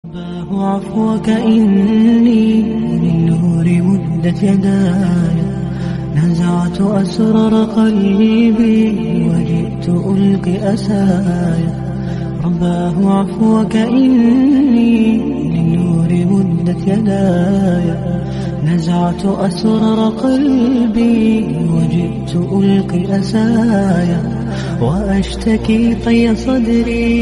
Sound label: nasheed slowed islamic song